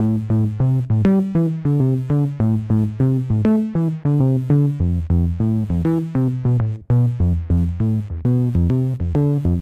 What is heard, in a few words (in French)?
Sound Prom Basse; Remember Me